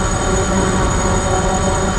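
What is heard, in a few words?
hover loop; movers objects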